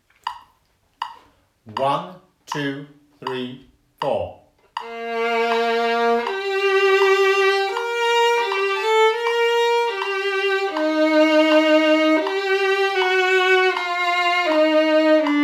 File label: Rainbow Violin